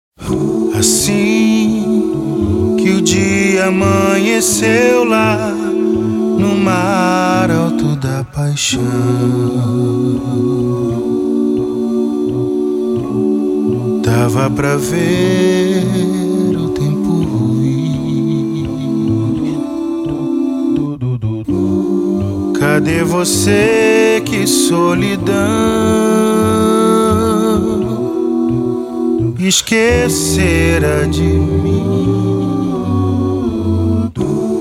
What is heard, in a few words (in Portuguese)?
acappella